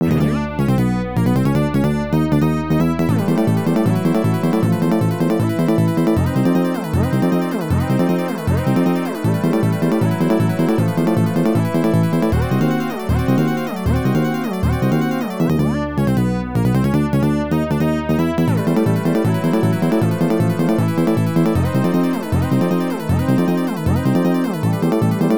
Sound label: Unused BGM